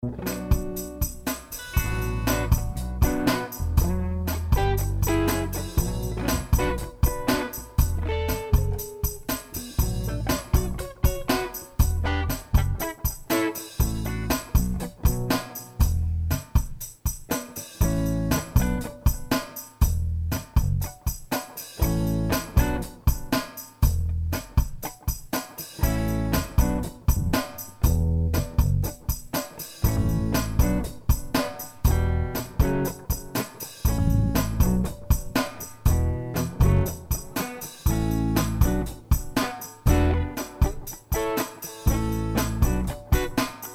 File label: Jam, Guitar, Bass; Jamjar